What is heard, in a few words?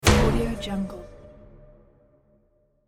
cinematic impact